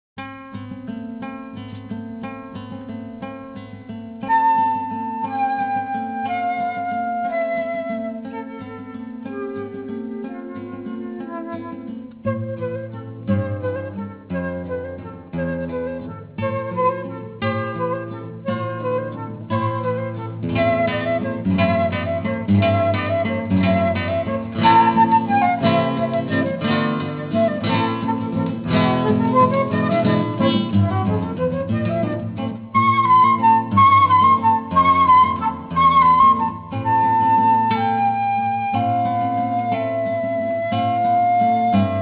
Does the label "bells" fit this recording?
Yes